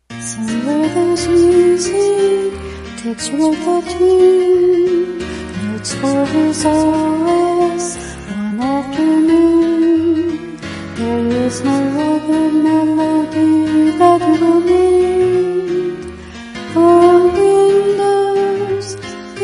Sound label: melody; pclinuxos